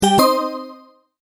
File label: multimedia tone start; Sound Design